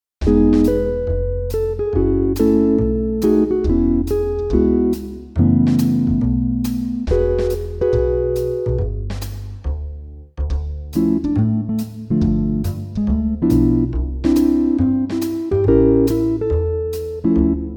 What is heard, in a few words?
Jazzy Guitar